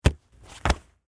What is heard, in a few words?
Football Catch; Sports; Receiver; Grab, Pass